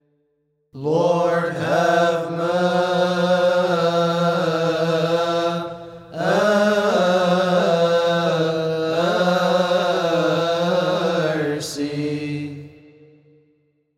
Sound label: Lord Have Mercy